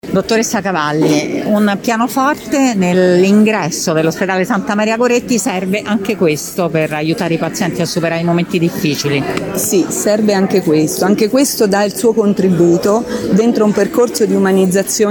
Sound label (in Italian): pianoforte